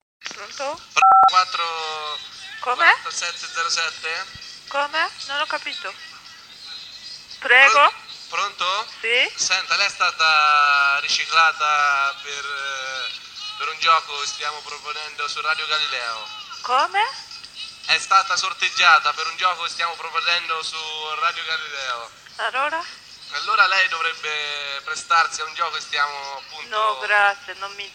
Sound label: Karaoke (failed)